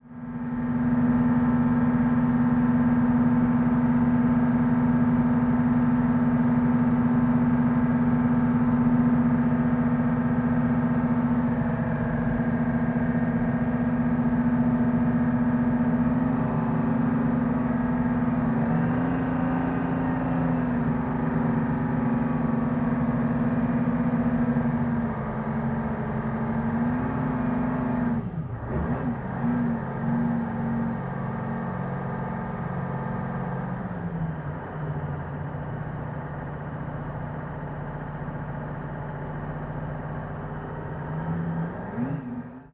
Military Pbr: Onboard Medium-slow Speed Cruise. Dual